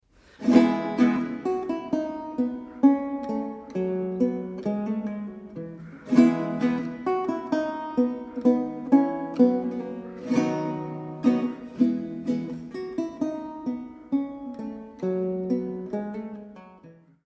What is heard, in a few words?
Roncalli Minuet